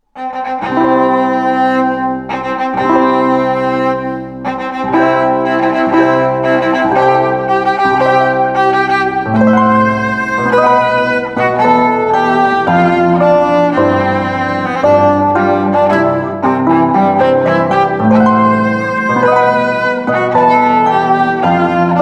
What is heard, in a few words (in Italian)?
Arpa & Violoncello; mendelson